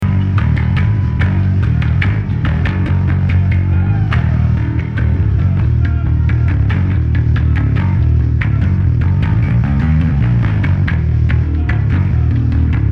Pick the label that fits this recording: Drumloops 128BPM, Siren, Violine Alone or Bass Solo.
Bass Solo